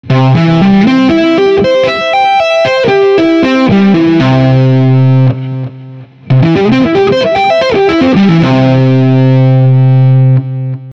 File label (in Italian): triadi preparatorie mano sx